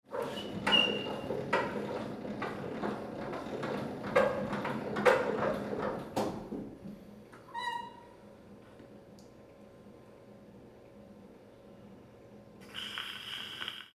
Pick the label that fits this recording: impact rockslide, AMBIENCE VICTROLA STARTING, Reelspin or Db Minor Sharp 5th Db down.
AMBIENCE VICTROLA STARTING